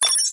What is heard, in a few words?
click sound